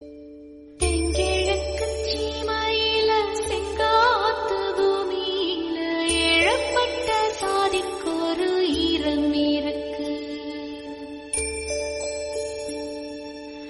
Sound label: Tamil Song Ringtone